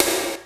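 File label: 90's snare drum, Roland JD-990; Drum Samples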